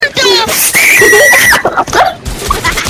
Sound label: scream